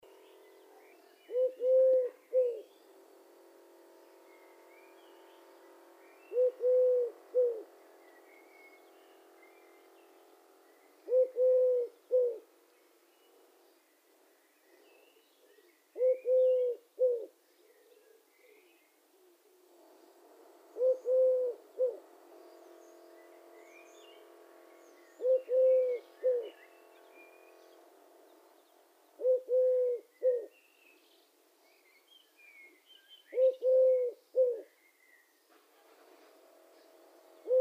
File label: Eurasian Collared Dove Streptopelia decaocto